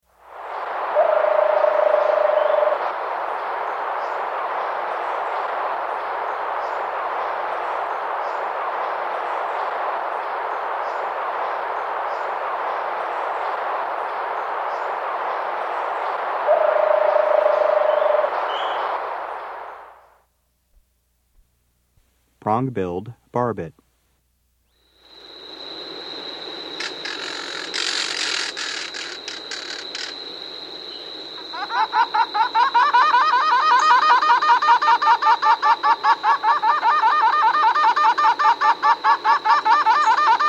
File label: Red-Headed Barbet, S. America